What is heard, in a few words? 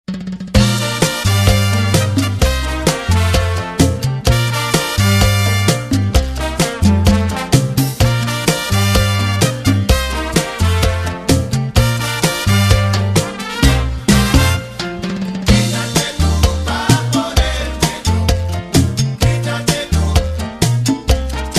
Cha Cha Cha